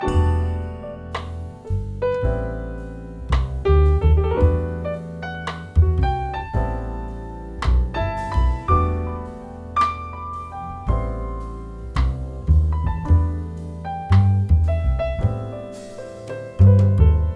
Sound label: Rough MIX; osaka mix eq